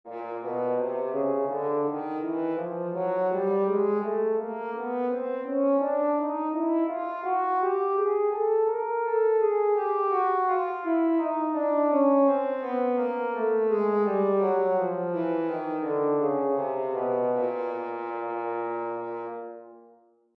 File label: chromatic scale french horn